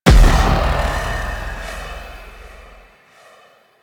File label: Harm Ability; Warframe; Animus; Anima Animus